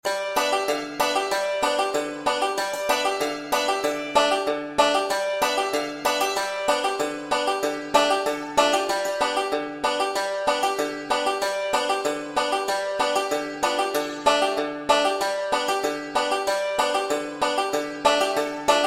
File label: old joe clark g chord acc, banjo; 5 string